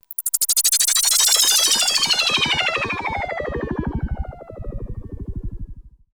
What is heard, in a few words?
sweep, SCIFI; Techno Trance Essentials